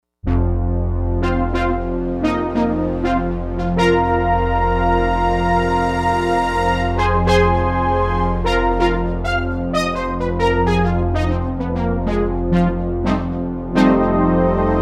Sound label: B3 Synth Brass; Digital Synthesizer